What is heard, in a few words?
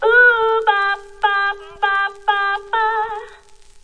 100 bpm Crackle Line; VOCAL OR VOXY LOOPS